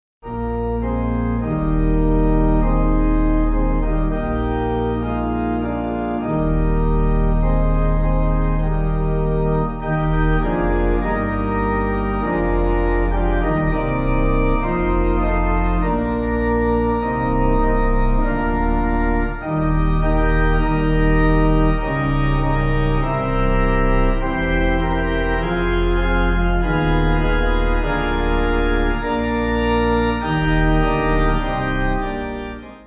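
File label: Pipe, pipe organ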